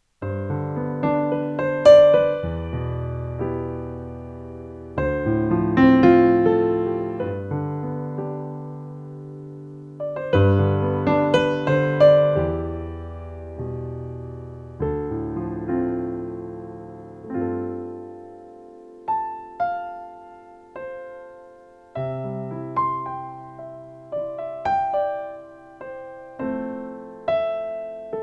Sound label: Roland RD-88 Concert Grand